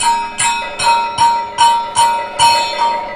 KATAK, Indian Traditions

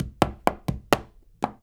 133 STEPS, Spanish And Gypsy

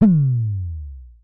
Synare Filter; Star Instruments Synare 3

